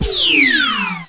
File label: get Item